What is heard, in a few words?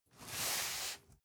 cardboard box medium slide short soft; objekte